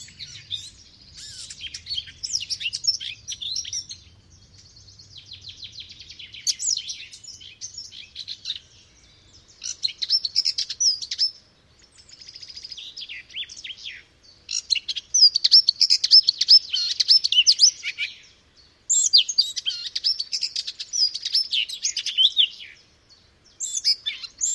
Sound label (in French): fauvette a tete noire; chant